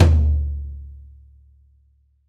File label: Jazz Lo Tom